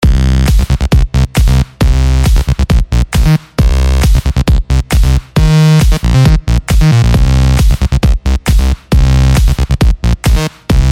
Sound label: Power Point music seamless